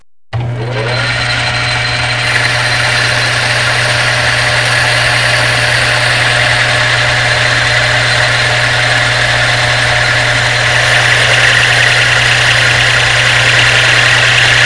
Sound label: CUT; My Science Fair